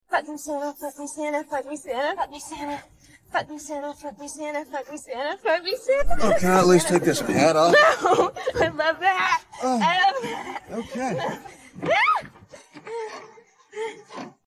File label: vocals; badsanta laurengraham